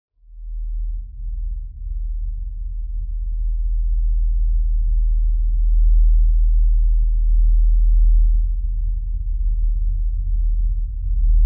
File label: drone